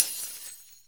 breaking glass - IDFT(abs(DFT(sample))) post-processed by cepstrum method